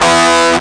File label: chord, guitar; OctaMED SoundStudio V1